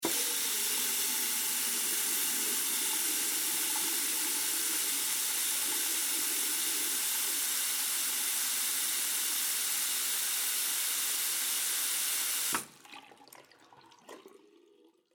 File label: Water Sink Faucet On Run Off Long, bathroom; Household